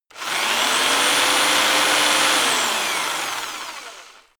rock drill rev high very long

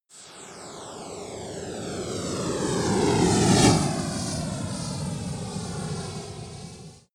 space passing fighting racer

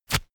paper towel roll sheet tear up fast

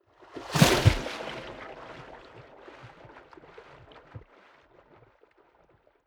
waves splash hard long

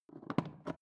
foot switch turn on off attempt

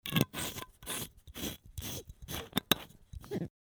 bottle close

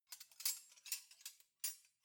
cutlery knives handle

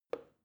plastic button start push soft

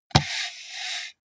computer mouse draw drop

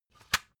cd cover close light

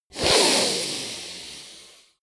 breath deep

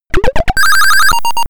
shuttle call from earth

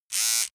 servo twangy

